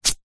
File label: Tape Rip